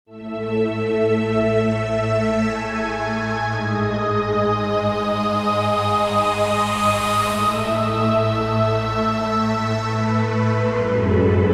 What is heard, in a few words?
Overture in C